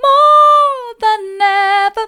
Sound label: more than ever mid, vocal